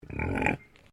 Animal Pig Landrace Grunt

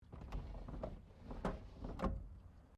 CAR MAZDA RX7 SERIES3 EXTERIOR WINDOW OPEN; Car Mazda Rx7 1983 Series3; Cars (Sound Effects:Vehicles)

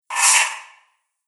Sliding Door Starship; Spacecraft Sound Effects; Sci-Fi Sound Effect

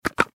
Button Switch; Multimedia Web Interactive

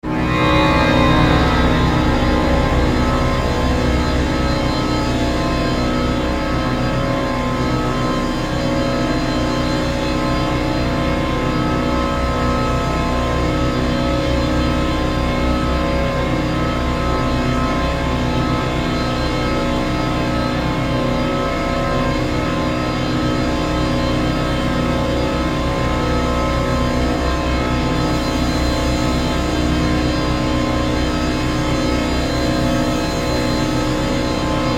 Drone, Organ, Sweeper, Horror, 5.1

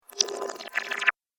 Water - Backward Bubbles

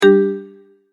Multimedia Tone-Stop

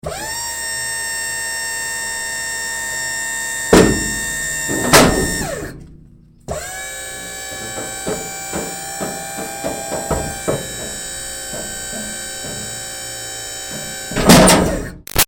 Servo Moving Truck Long Metal Clunks; Motors; Machines; Big Room Sound

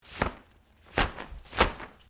Umbrella Shake; Umbrellas (Sound Effects:Objects)

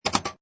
Pinball Sound Mechanical Movements; Video Games; Sound Effects:Games

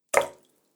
Water Hard Plop; Dripping Sound Effects

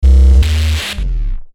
Electrical Static Pulse Descend; Production Element Imaging Element Accent Transition

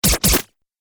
Laser Stun Shots; Laser Gun Sound Effects

